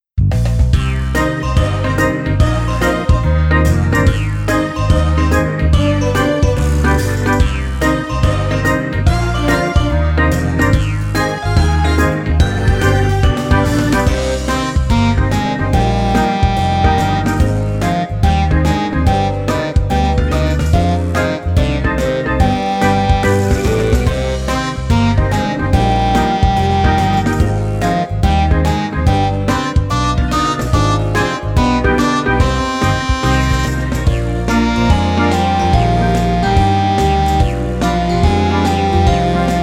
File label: Synth